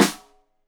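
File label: Snare Stereo, Drums; Deeply Sampled Drums; SMDrum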